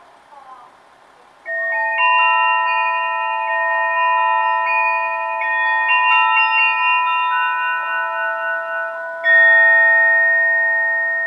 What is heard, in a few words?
takaoka local himi, station